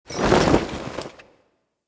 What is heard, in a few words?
Idle Screen Foley Fast; Kuva Lich